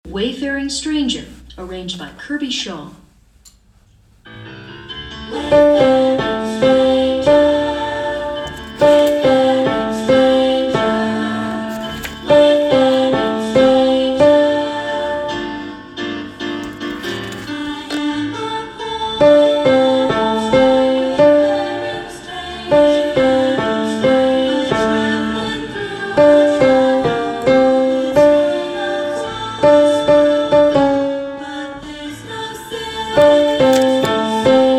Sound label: wayfaring stranger tenor bass